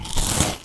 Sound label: Big Bite; Classic SFX; musicradar; cartoon caper